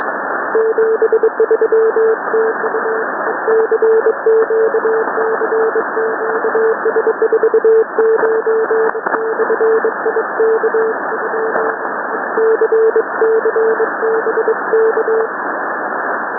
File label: sending random prefixes